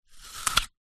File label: human eat bite apple